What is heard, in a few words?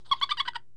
laughter; dracula